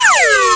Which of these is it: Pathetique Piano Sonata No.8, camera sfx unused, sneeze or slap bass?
camera sfx unused